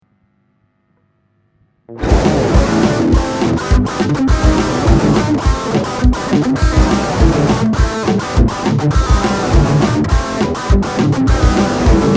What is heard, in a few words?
JCM2000